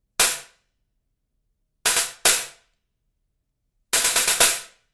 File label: Stacker HiHat, cymbal sound